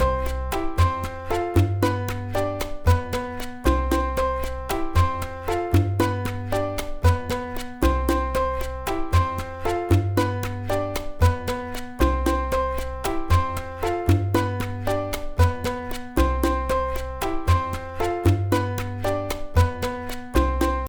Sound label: Mambo and Montuno